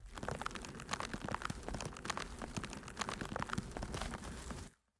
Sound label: Vines SFX, Forest